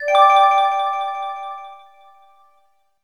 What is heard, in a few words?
System Accent